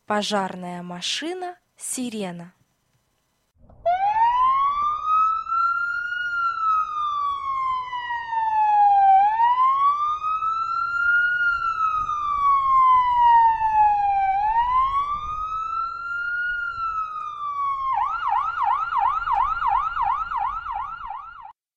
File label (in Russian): Пожарная машина сирена